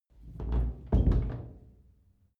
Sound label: old door close soft